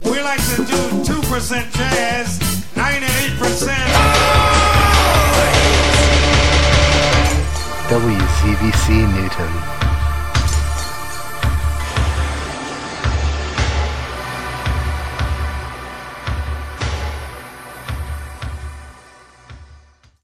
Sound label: jazz